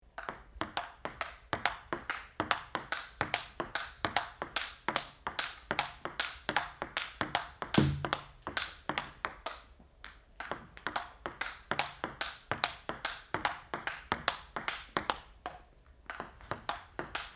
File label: pingpong